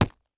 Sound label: Horror Impact Punch Body Blow